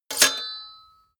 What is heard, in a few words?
sword block metal clank